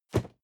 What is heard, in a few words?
cardboard box medium place hard; objekte